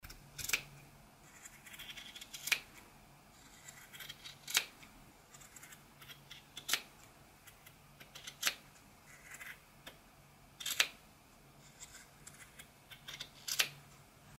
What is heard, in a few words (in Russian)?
tkan rej nojnicami